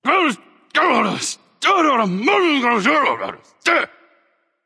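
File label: nonverbal grumble; smite; voicepack; merlin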